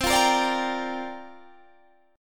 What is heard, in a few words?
C 9th Suspended 4th C down Guitar Open D